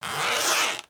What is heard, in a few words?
Plastic Zipper Long